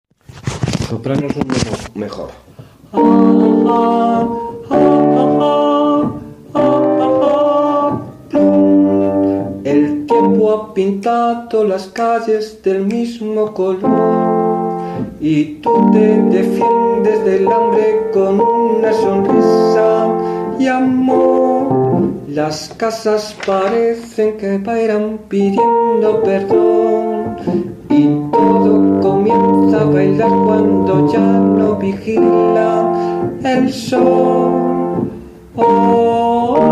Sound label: sopranos